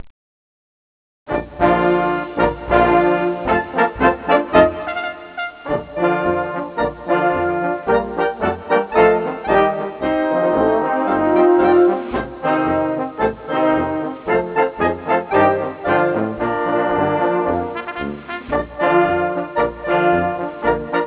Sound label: Polka